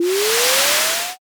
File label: wind magic